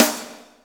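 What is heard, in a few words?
SNARE PICCOLO; Roland L-CDX-01; Snares 2; Sn Modules 2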